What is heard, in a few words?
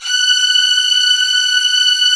F#5 violin; VIOLIN1